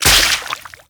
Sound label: Wyrm Bait Burst; Ambience; Gameplay; Warframe; Infested Eris